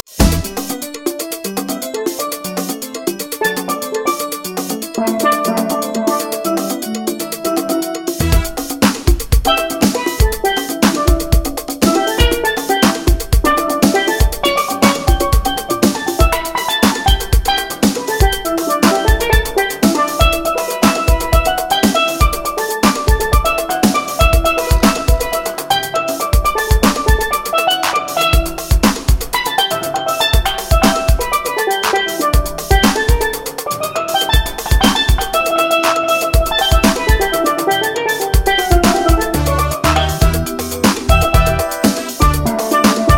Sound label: steeldrum, instrumental; Congo Miami